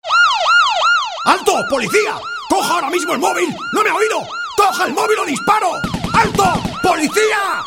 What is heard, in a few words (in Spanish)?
Policia